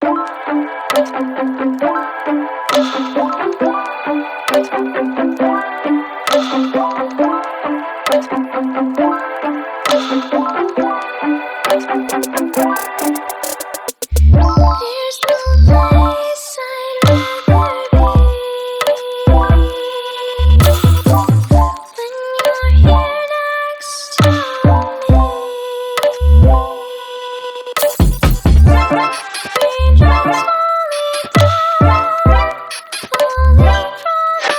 raindrops, future bass flip; Pony Music Archive; miku's

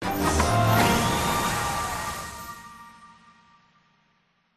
Level Up; MLP Friendship is Magic (Mobile Game); My Little Pony OST Repository

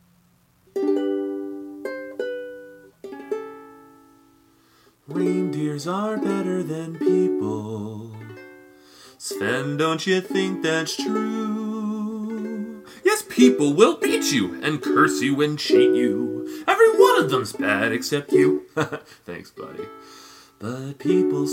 voice ukulele cover

